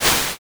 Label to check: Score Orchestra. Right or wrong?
Wrong